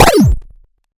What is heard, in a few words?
door; kaplay